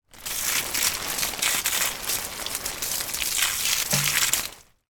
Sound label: household watering can plastic water plants; sound effects one